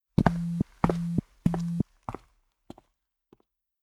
Stereo Footsteps, notifications; Motorola; Edge 70 Max China

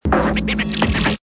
Scratch It; Motorola; V180; MTV

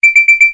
Wake Up, alarms; Pantech Perception

